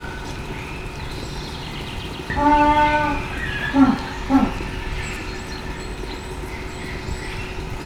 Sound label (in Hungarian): éppen hogy, pápaszemes pingvin; debreceni zoo 2012